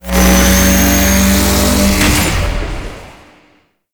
Laser Wall Build; Enemies; Weapons; Warframe; Tau; Sentient Dactolyst

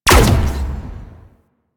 Capital Ship Turret Fire; Warframe; Vehicles; RailJack Enemy Ships; Corpus

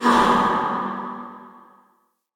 Ghost Soldier Pain, Vocals; Enemy; Wandering Ghost Soldier

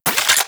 Anti Aircraft Gun Mech End; Weapons; Warframe; Archwing

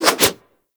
Axe Small Spin Swing; Warframe; Weapons; Swings

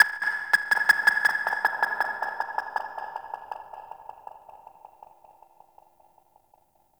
Grineer Frogman Attack, Vocals

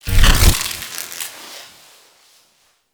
Arachnoid Wraith Web Deactivate; Warframe; Corpus; Abilities

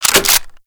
Spark Rifle Reload

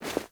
Movement Foley; Warframe; Wisp

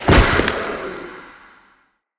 Mockery Collapse Husk Trap; Enemy; Foley; Mockeries; Characters

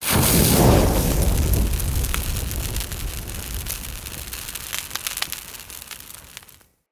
Fire Hazard Object Ignite Sizzle A; Undercity

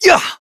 Jump Adult Operator; Warframe; New War

